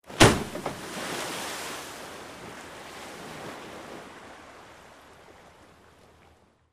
Drop Impact Vehicle Dropped Into Water, Shot From 15 Feet Away